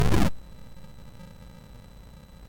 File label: sid MOS6581R2, GAMES; Face Off